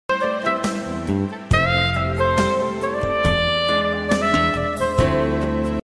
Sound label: Jazz music, بی کلام